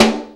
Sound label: Snare, Percussion Hits, Snares